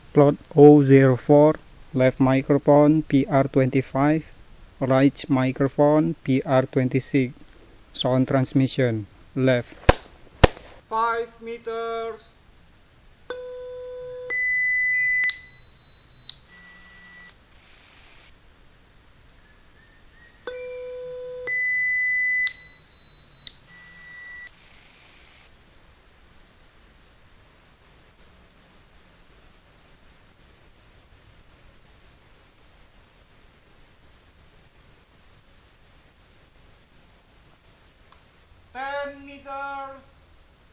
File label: birds